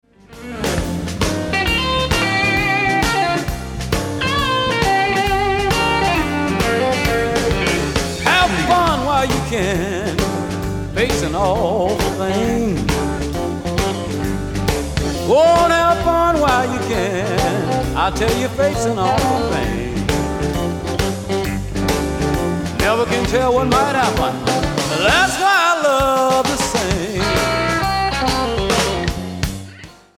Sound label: Shuffle